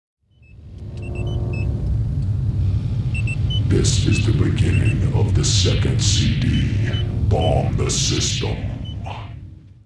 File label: Hip Hop Intro